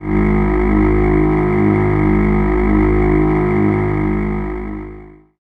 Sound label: choir G#1, MIRAGE; ProSamples vol.55 - Retro Sampler; Best Service